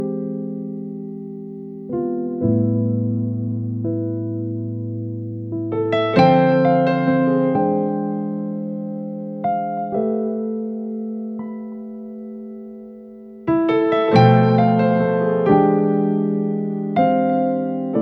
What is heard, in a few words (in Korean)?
(Piano Versi